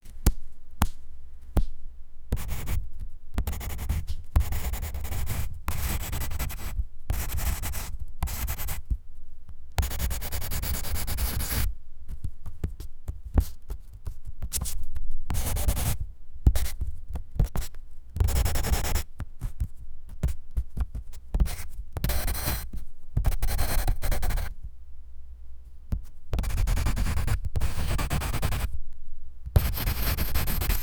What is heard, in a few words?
clean sharp sound of a, stationary